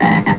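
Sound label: swamp, ambient; Quake; PainKeep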